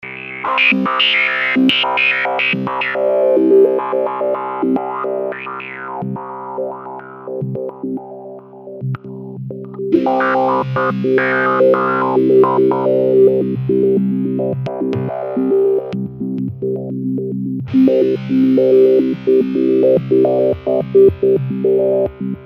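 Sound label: Obi wah, Line 6 M13 guitar processor; Oberheim Voltage Controlled Sample and Hold filter; вау-секвенсер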